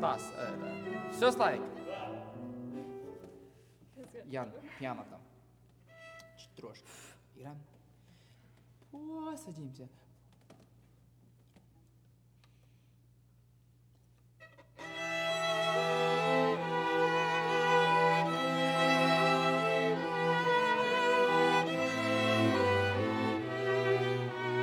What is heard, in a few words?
Overhead